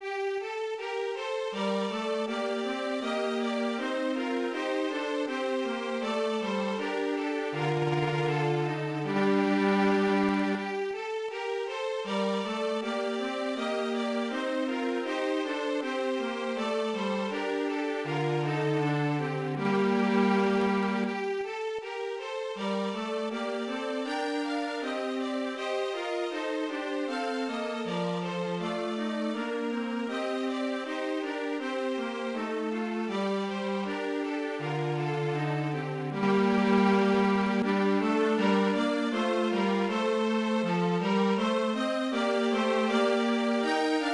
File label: trios